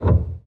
piano release; samples-piano

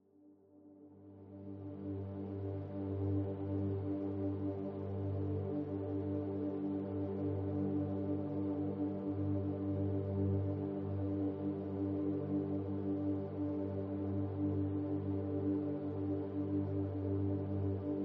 bg loop; thetascape; delta

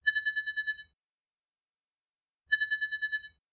notification

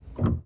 piano release; samples-piano

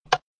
pressure Plate Click

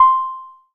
beep

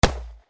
single gunshot

